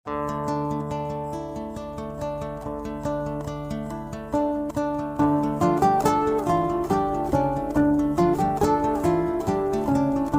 pakistani drama ost ringtone

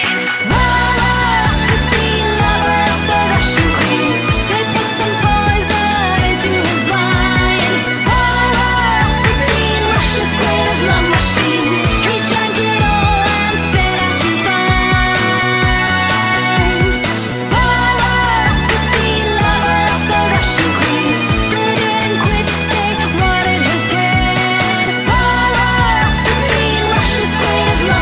top dj music ringtone

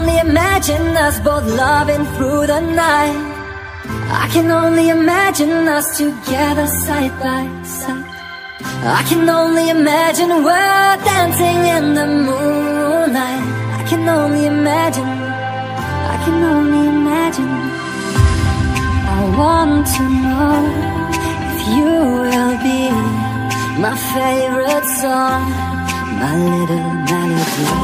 for boy hot ringtone; english ringtones

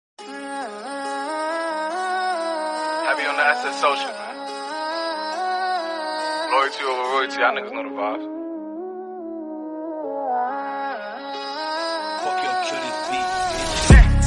soothing music english song tone ringtone; english ringtones

